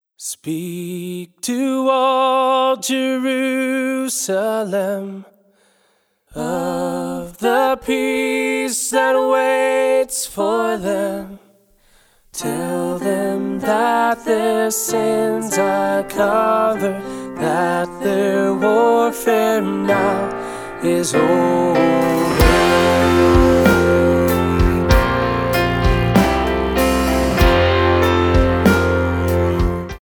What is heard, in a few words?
church bells Comfort Comfort All My People